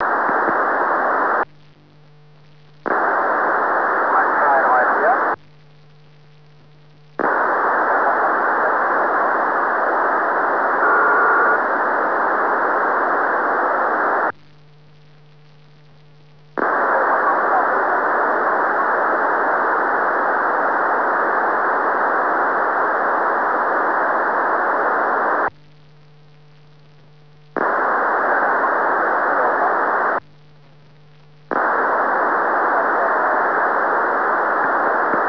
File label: ECHO SSB, Amateurradio; EME-Echos; with 8 x 144x28 on 2m; Two samples for EME-Echos